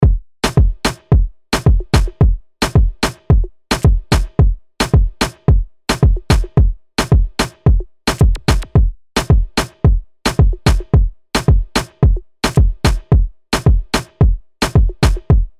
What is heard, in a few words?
DRUM LOOP REGGAETON 110BPM